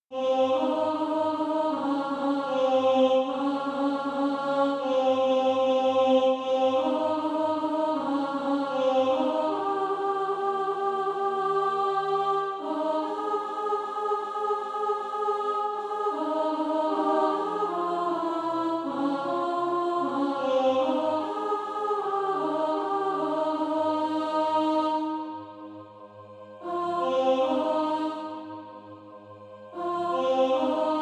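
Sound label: alto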